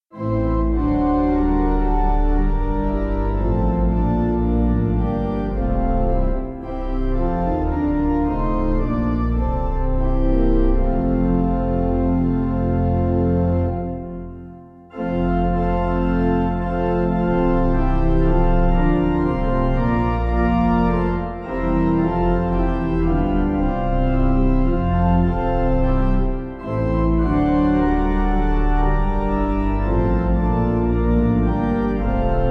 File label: Pipe